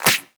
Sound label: Pre-Shifted Claps; Vengeance Samples